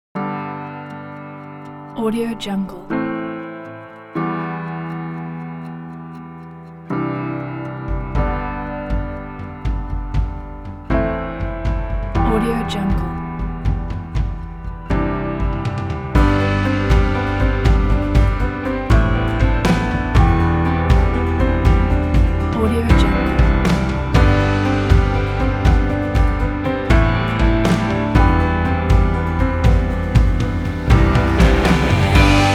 uplifting and inspiring indie folk

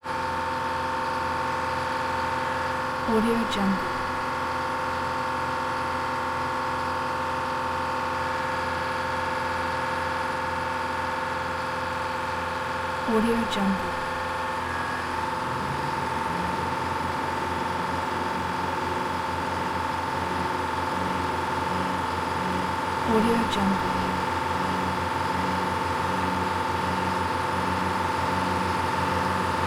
tugboat interior engine room generator idle

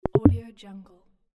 access denied

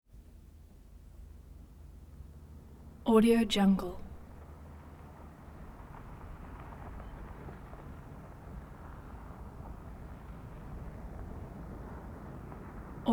1973 dodge tradesman van slow drive in stop; prosoundfx

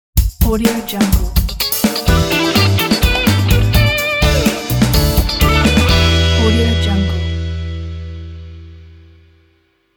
lounge funk logo

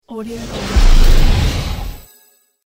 super whoosh

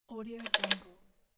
old camera click

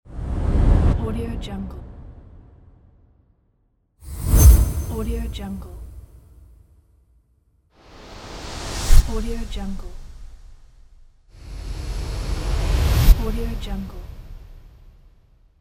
wooshes

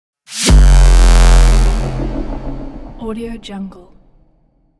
modular synth hit; sinematic 2; soundmorph

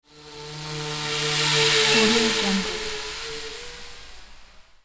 little drone flyby

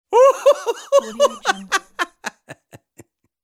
male funny laugh

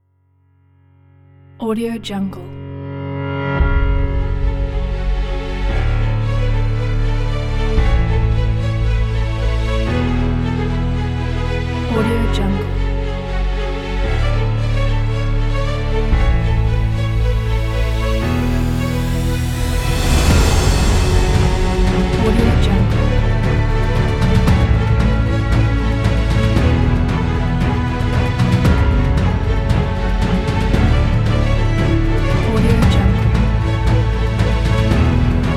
epic

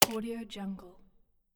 mechanical keyboard key spacebar

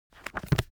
object book heavy book shut; smartsoundfx

